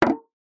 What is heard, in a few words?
instrument drum small gamelan hit stick single